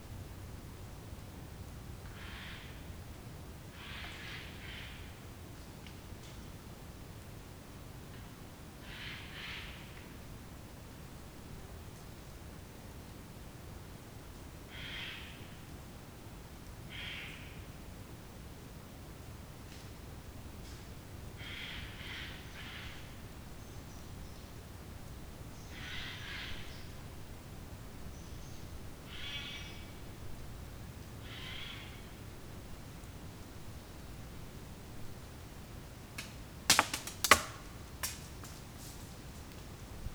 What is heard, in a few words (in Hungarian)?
a meglesett erdő, szajkó, Aggteleki-karszt; allathangok; termeszetben